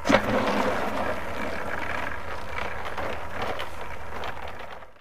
item warpaint apply start

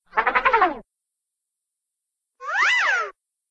double takes; cartoon; hannah barbera cartoon sound fx

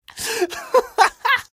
laugh happy; scout; source engine; tf

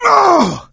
hurt major; voice; source engine; left 4 dead 2; survivor

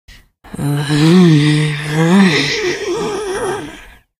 fakegroan; episodic; episode 1; Source engine

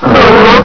ag pain; agrunt; video games; source engine; bshift

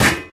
physics turret gibs

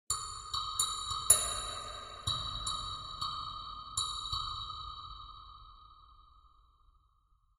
ambient synth grain click

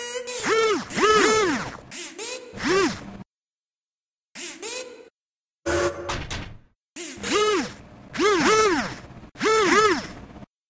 turret construction; Source Engine; Portal 2

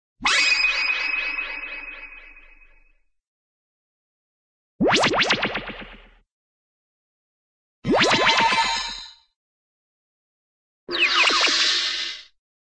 magic magic burst; cartoon

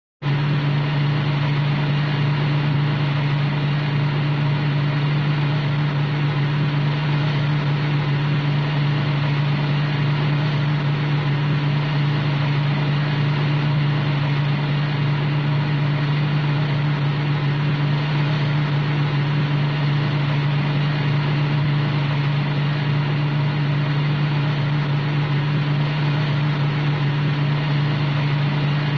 auto, cartoon mystery van; scooby

